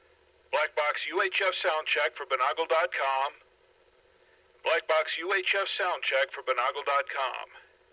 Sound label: blackbox uhf, radio samples